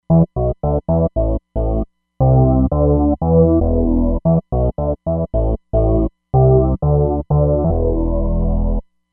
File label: Artus Bass